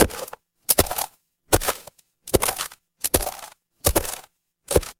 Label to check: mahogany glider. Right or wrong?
Wrong